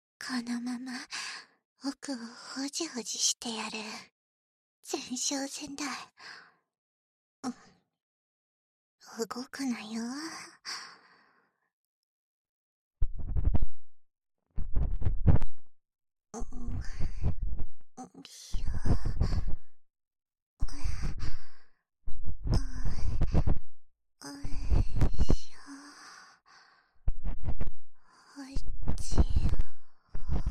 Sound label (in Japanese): 【左】強圧耳奥かき